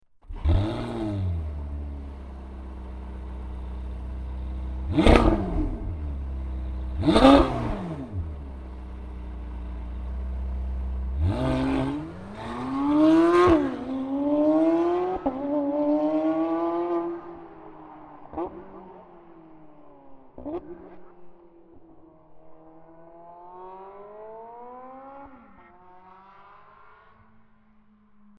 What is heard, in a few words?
Porsche 918 Spyder